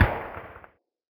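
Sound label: player gun distant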